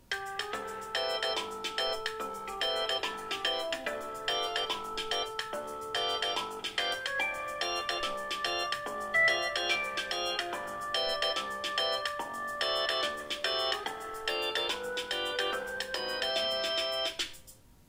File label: ringtone; by the seaside; apple iphone 5